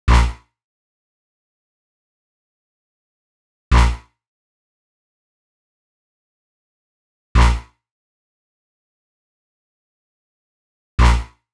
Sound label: 132 Em Bass, Bpm132, Complex Electro Synths; Prime Loops; Ossify